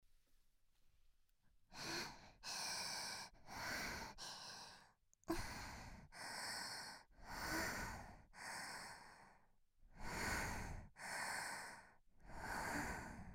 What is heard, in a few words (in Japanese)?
吐息、あえぎ; カレン